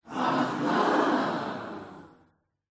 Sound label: small mixed crowd of 30 people reacting surprised saying aha in unison; Sound Ex Machina